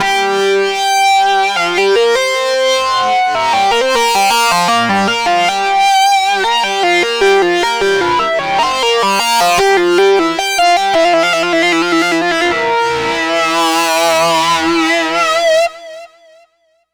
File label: Feedback Lead; Dr. Fink's Funk Factory